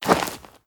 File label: Dirt Walk